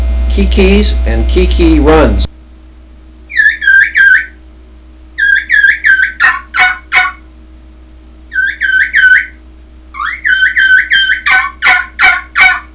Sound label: dixie dbl kee kee kee kee runs